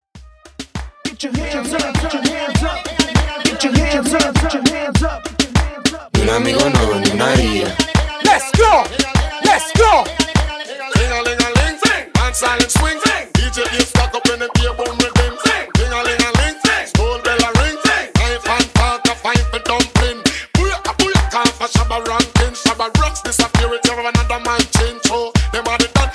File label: 90s Dance Hall Moombah Hype Intro Hook 100 BPM